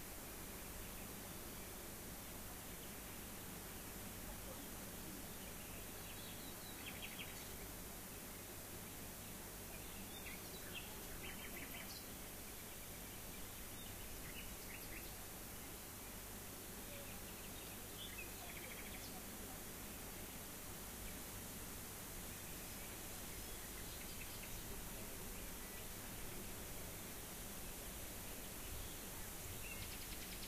rayol farm; SEAMED

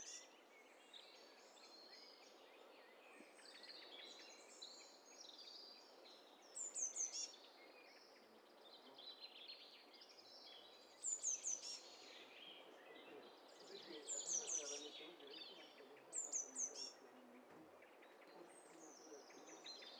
STEREO BIRD COCKTAIL PARTY

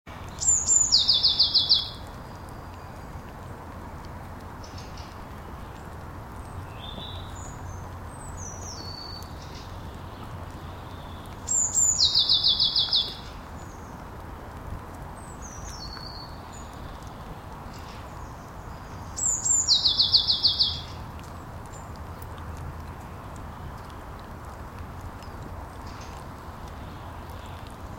Cyanistes caeruleus; SETE

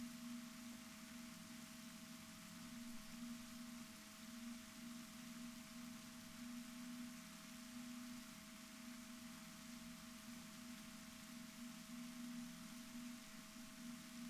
rorquals negatif, Balaenoptera Physalus Chile

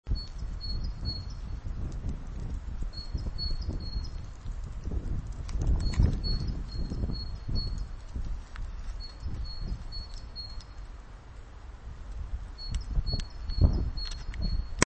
Parus major; SETE

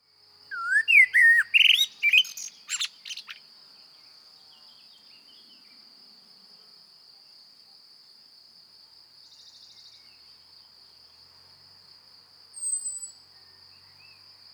Turdus merula; SETE

